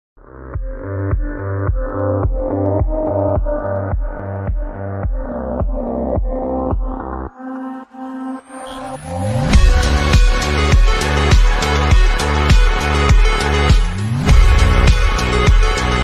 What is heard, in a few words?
attitude ringtone english ringtone instrumental ringtone bgm ringtone